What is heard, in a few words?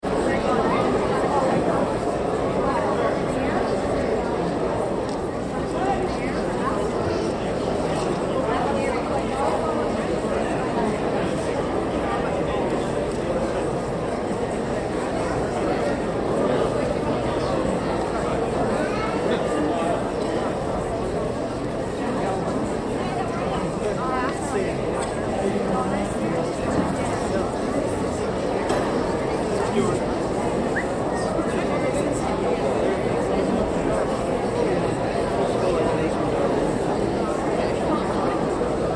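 Crowd High School Graduation Idle Chatter; Auditorium; Crowds; Backgrounds; Big Room Sound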